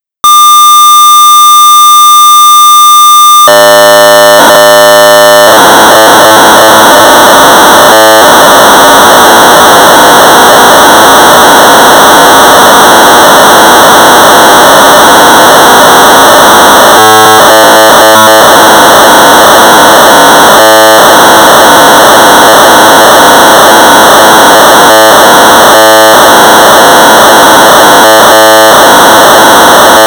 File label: Sorcerer 1200 baud; Yahtzee; Ian Gilles; Dick Smith Electronics; BASIC